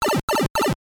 Pipe - Powerdown; super mario bros. 3 1988 nes

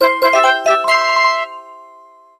Star Coin Collected; new super mario bros. wii

